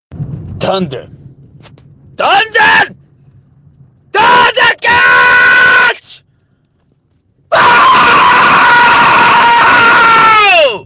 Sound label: thunder